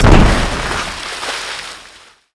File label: zodiac crash impact; phy zodiac crash impact